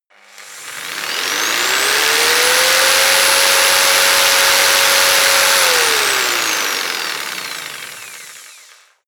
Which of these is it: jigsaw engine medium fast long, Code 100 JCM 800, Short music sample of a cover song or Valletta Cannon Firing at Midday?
jigsaw engine medium fast long